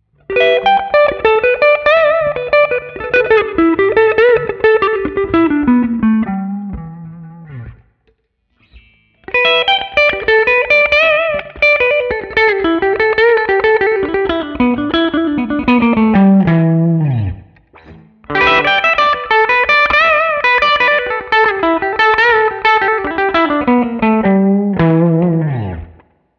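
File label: Guild SFIII Rockabilly 12th Riff, guitar; 2016 Guild Newark Street Starfire III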